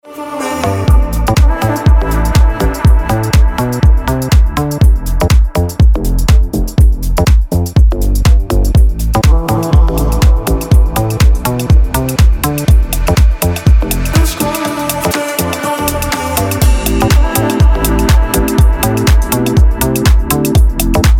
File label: EDM